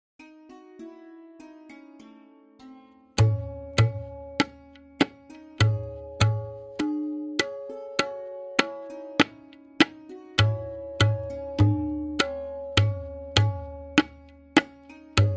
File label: Main Bol 1x-Played with Lehra, Benares Kaida 1, Tintal; Benares Kayda 1